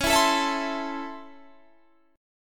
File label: C sharp Minor Major 7th Sharp 5th C sharp, chord